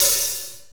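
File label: hi-hat, Techno Rock; Northstar; Drumscapes Roland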